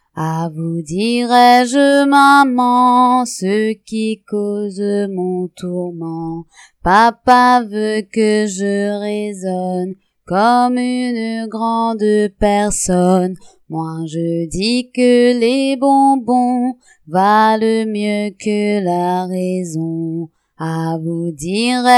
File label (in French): acapella; ah vous dirai je maman; comptines francaises